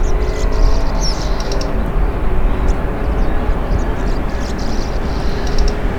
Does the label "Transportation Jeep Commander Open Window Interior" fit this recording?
No